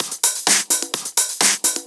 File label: Discohouse Loop 128 BPM